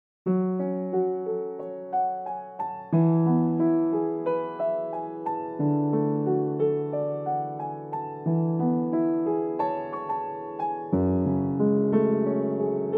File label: Unplugged Cover